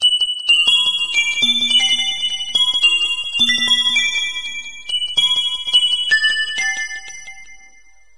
stars loop; There; ThereClient

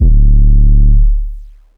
808 Solid D, Synth

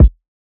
Kick; Ableton Live 12 Lite Core Library

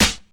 Snare Hat Combo Shuffle

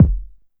Kick; Ableton Live 12 Lite; Core Library; Drums

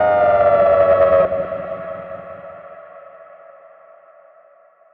Siren Wind; One Shots; FX; Ableton Live 12 Lite; Core Library

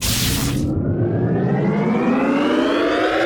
activate machine; OuterWildsModManager; OWML; AnonymousStrangerOW.TheStrangerTheyAre

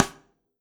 Rim Sidestick Hard Dry Session, Drums

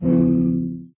forcefield; There; ThereClient

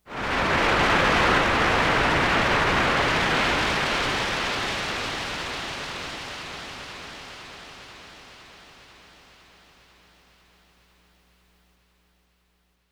Wind Air; Loops; Texture; Ableton Live 12 Lite; Core Library

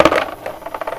dice roll; There; ThereClient

